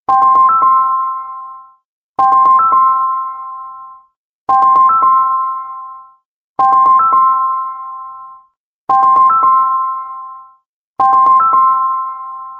T-Mobile Ringtone

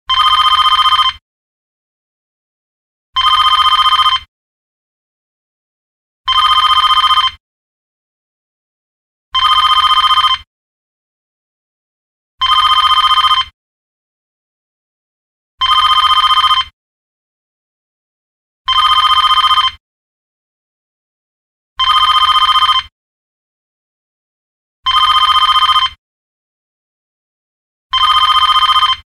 Telephone Electric Call

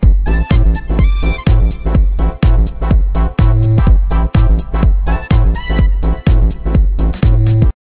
ringer; Ringtones

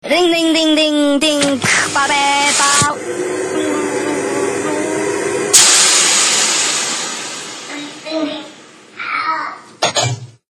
Vacuum a frog

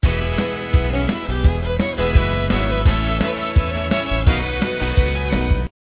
ringer; Ringtones

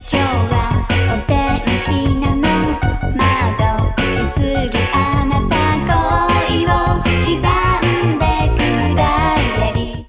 ringer; Ringtones

